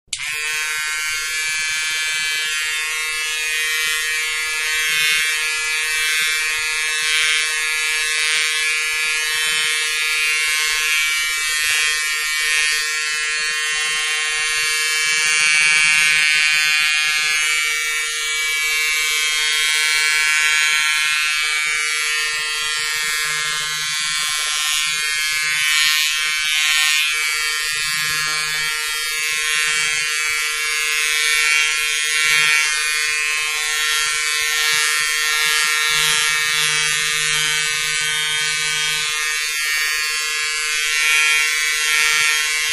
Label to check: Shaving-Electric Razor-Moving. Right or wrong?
Right